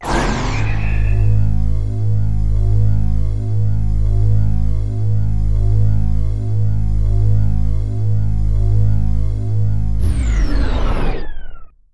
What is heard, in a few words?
tractor beam; Tanks